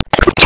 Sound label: Short Sword Impact Flesh; Weapon Impacts; Swords; Dendrit Short Sword; Combat